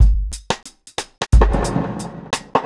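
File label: reggae drum FX; Best Service Advanced Media Trax Modern Composer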